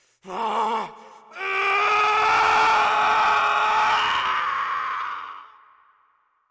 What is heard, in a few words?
Yell; a dump in the dark